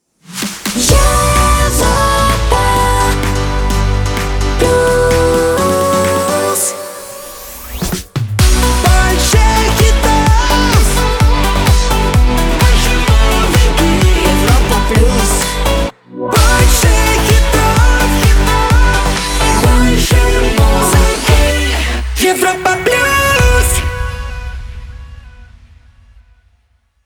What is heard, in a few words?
Jingle; Europa Plus